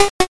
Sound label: cough